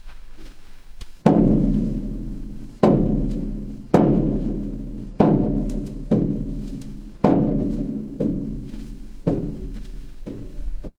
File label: ambience rain